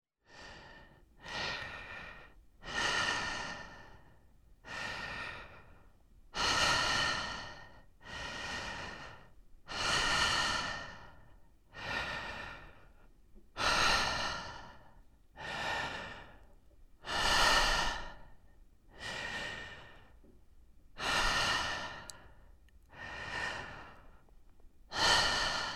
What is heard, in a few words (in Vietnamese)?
Tieng hoi tho roi chim vao giac ngu